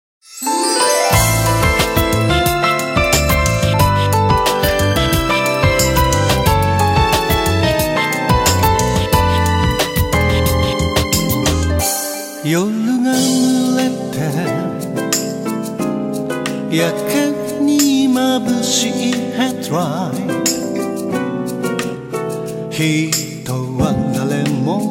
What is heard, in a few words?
Pops